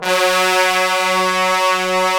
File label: BRS TBNS, Bone Sec ff; Roland L-CDX-03 Disk 2; Bone Sec.FX